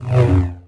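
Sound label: sith lightsaber Swing; weapons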